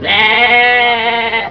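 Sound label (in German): mäh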